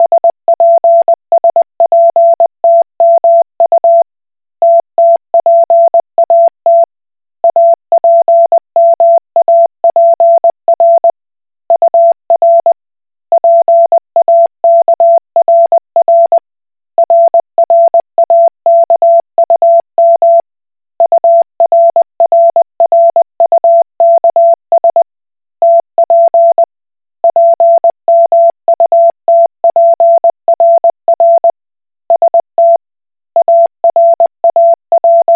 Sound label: Koch Morse